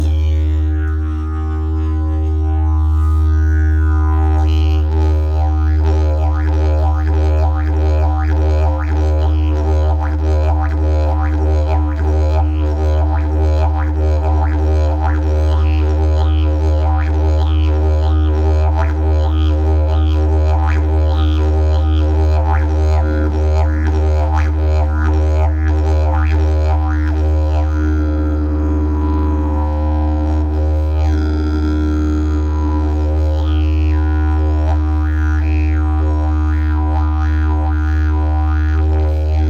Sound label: didgeridoo; jilm kvetostisk